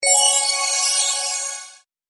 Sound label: Glitter Tone Alert